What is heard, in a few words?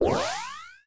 Player Spawn; liblast